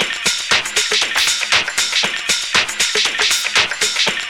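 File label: drum loop; X-Static Goldmine 3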